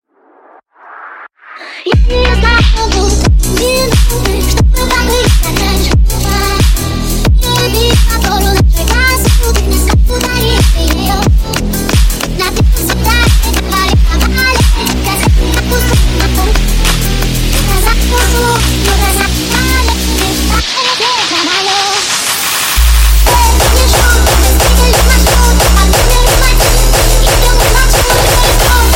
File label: Hardstyle Remix